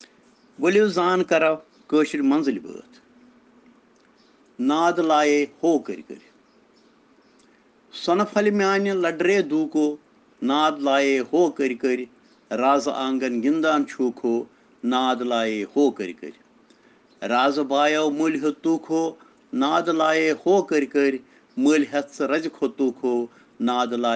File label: Children Rhyms in Kashmiri